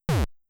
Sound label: Take Damage; mother1